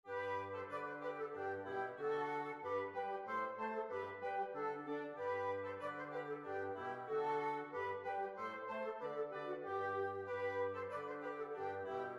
German Spa, big band

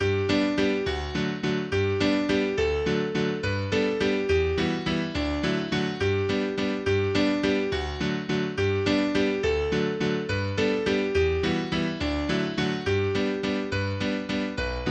Madam Saquis Waltz, english dance tunes; eighty english dance tunes

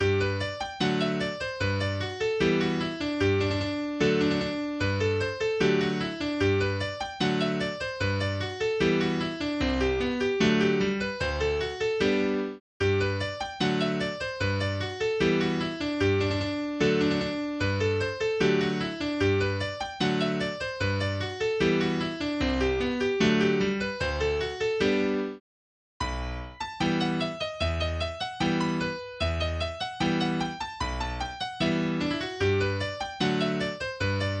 Borobridge Hornpipe, english dance tunes; eighty english dance tunes

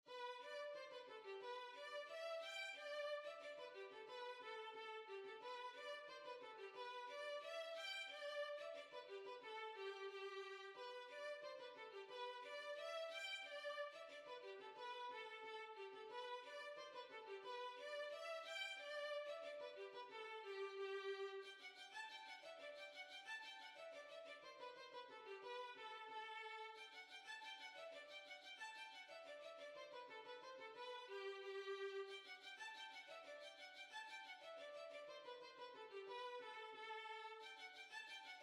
Violin

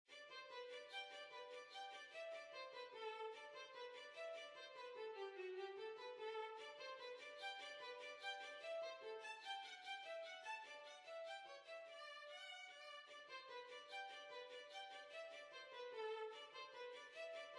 Violin

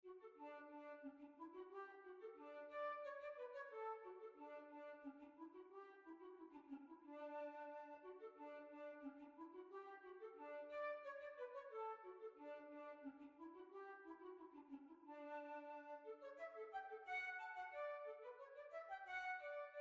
Waltz; Lord St Vincents Waltz

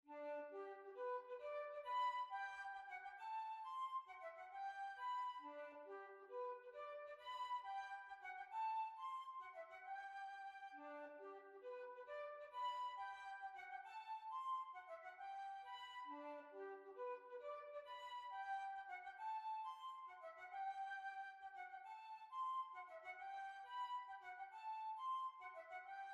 Tyrol Waltz

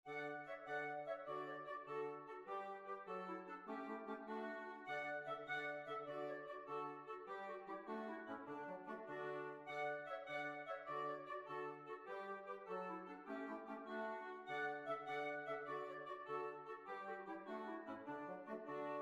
Kings Bridge Assembly

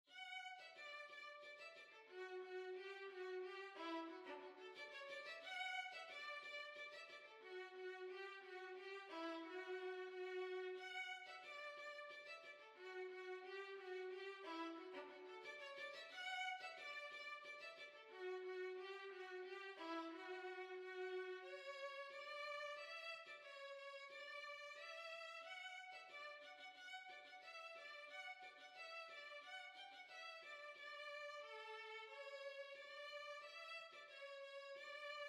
Violin

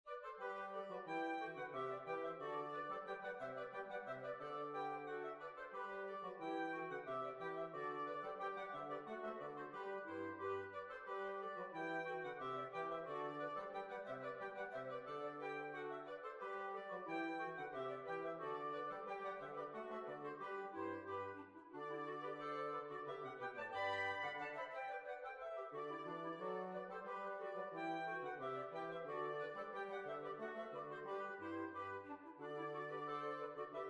Hornpipe; Miss Menagers Hornpipe

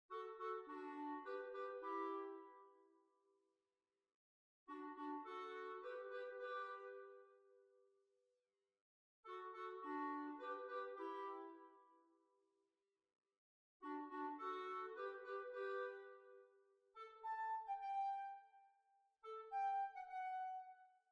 Polka; B flat

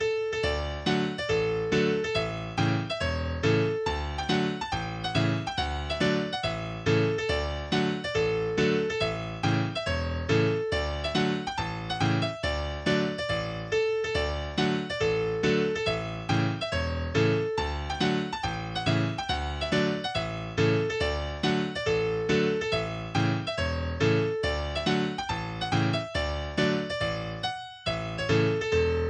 Russian March, english dance tunes; eighty english dance tunes

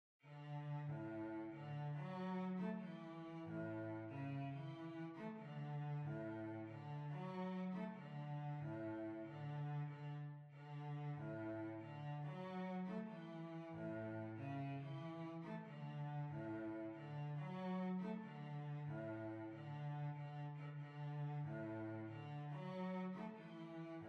Quadrill, Violoncello

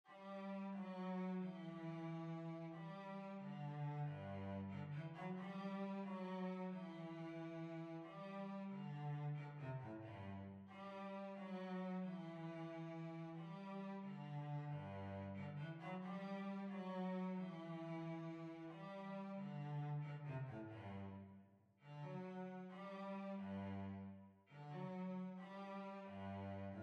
Violoncello

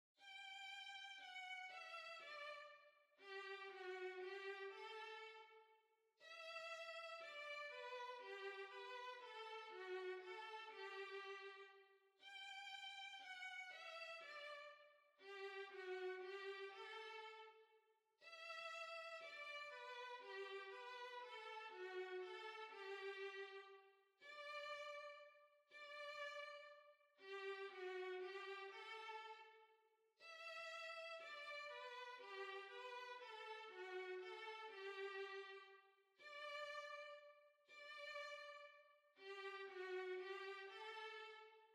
Violin

